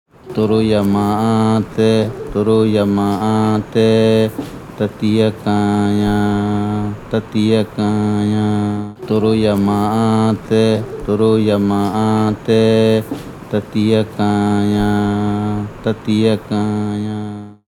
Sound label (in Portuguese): canto da cotia; waki; Paiter